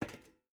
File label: footstep rubber boots metal walk; Rust